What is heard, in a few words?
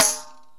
PERC & KALIMBA